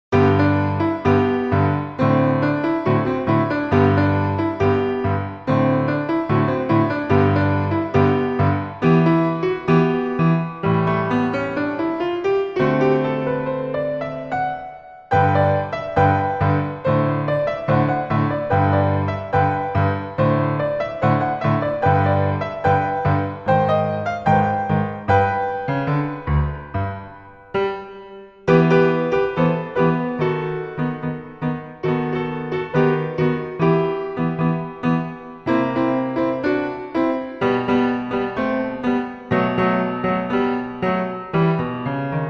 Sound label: Samba; Tastenkrokodil